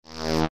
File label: future buzzing elements layer